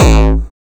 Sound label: kik